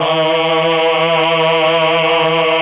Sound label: CHOR, Propeller Island Legendary M400